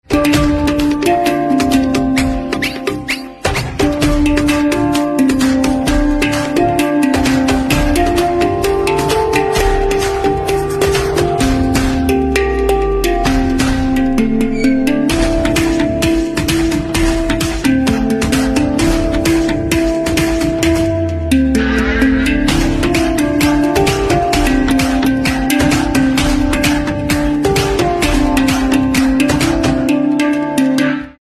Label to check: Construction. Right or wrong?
Wrong